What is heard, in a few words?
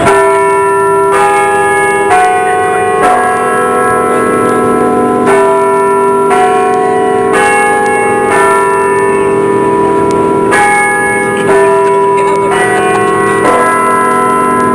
Church Bells; Soundsation